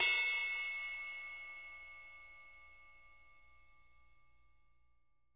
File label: rides